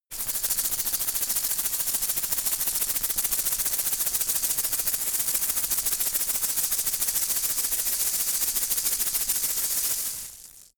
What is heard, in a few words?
insect shaker rattle hard